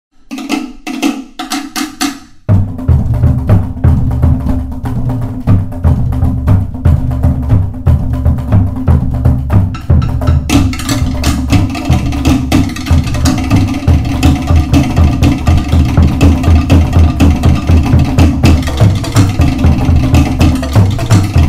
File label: beat; polymusic